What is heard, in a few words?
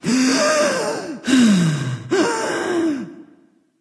breath; smite; voicepack; nike